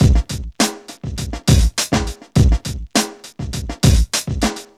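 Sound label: 102 bpm, Funky loops; Zero-G; Funky Elements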